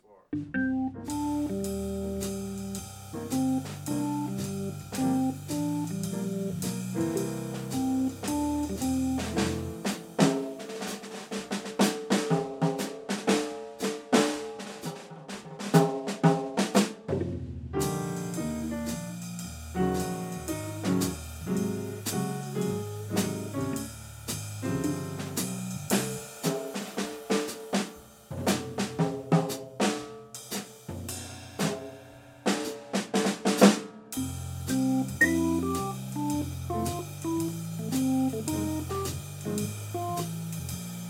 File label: jazz Solos